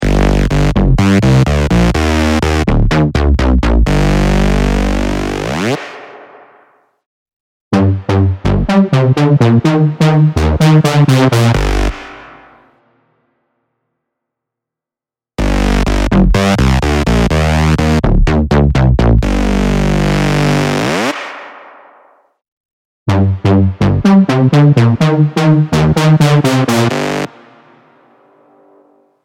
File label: Drop lead